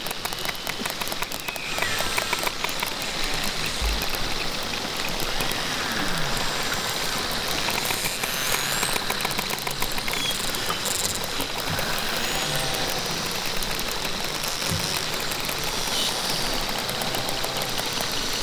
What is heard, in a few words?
narwhal